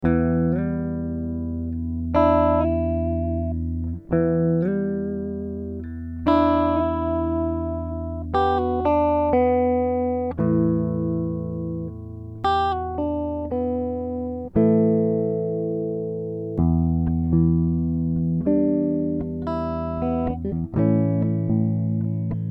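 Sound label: Em 116 guitar riff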